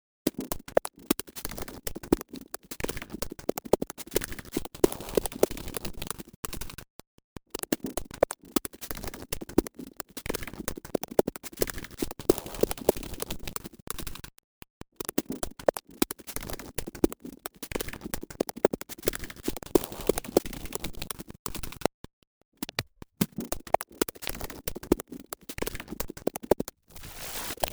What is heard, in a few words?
mouth beat glitchy